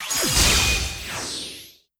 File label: Player Change Cutin